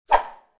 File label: swish